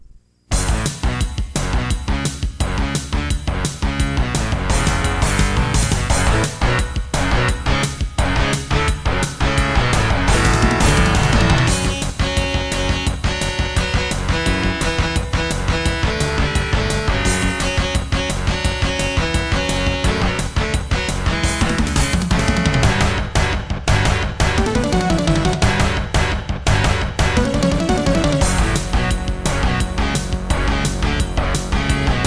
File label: Intro, E-Wave; Serdaco; Duke Nukem 3D